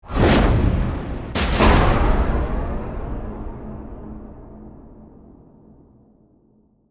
Whoosh Hit Flange, Production, Element, Whoosh, Hit, Flange; Whooshes